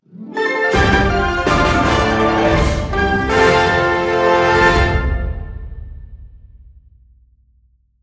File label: win theme; Brawlhalla